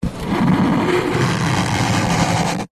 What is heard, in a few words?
cardboard box flap move slow creak